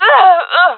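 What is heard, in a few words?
death, jungle female; vo; agents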